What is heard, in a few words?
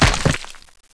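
concrete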